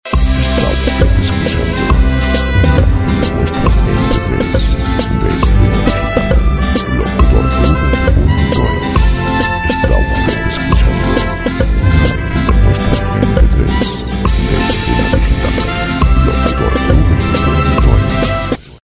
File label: 146 pop; Música pop